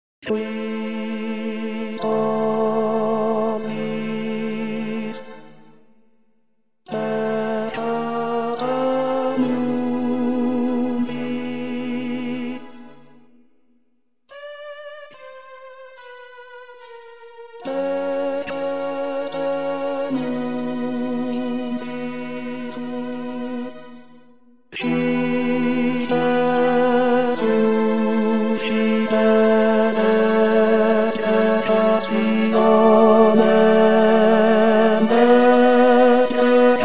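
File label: Qui Tollis Tenor; vivaldi gloria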